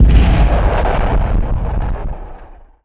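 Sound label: medium explosion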